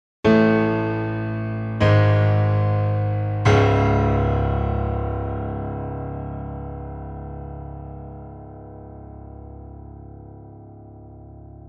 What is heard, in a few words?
Prelude Intro; The Grand 2; Rachmaninov